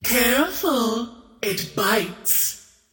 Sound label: attack whip; queenofpain